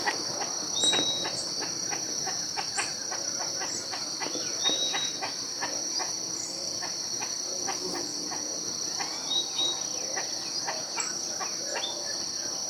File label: Speckled chachalaca; پرنده گوش خراش خالدار